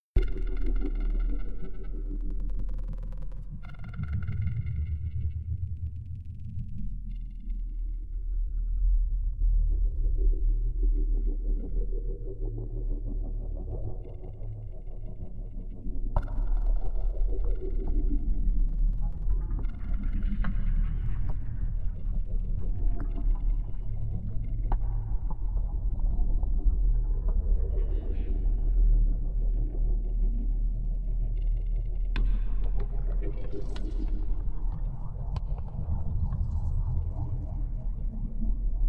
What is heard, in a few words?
Noise; Blue Madonna: A Carol Reed Mystery